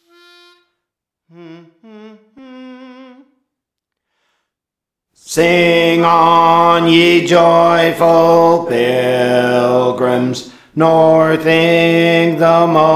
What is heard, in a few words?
Bass, Praise For The Lord Training